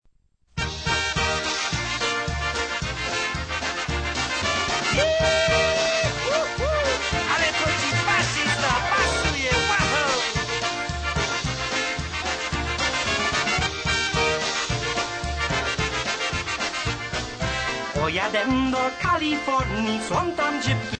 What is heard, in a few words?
POLKA